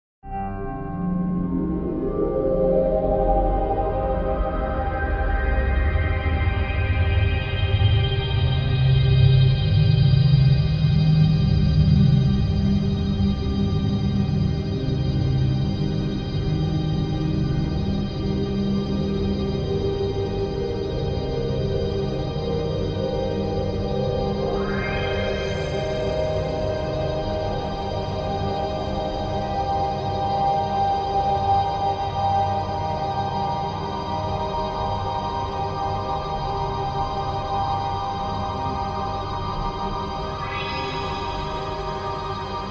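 sound design soundscape musical drone guitar C3 only; audio mangler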